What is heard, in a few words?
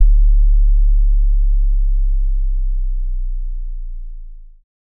808s